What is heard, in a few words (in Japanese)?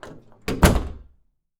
ドアを閉める音